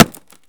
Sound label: plastic; Escape from Tarkov